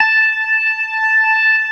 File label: ORGAN, C3 PERC; Keith Emerson Signature Series